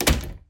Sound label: door close